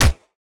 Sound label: Action Gun Shot